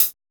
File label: HIT CHH; Drums In Your FACE!